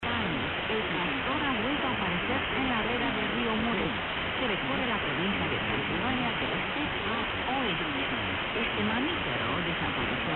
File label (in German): Kurzwelle 22m Uebersteuerung 13500khz; ALA